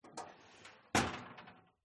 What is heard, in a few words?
Door Appliance Oven Close; uberduo